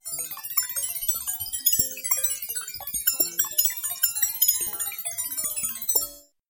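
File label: electronic sounds of data transmission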